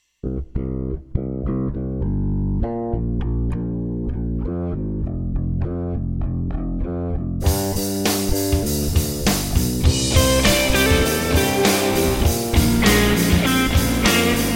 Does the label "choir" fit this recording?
No